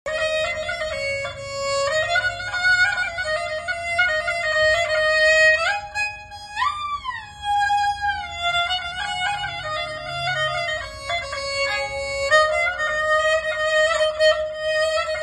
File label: Kamancheh Lori; کمانچه لری